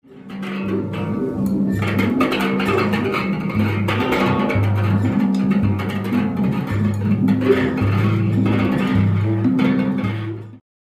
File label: slide clip; second floor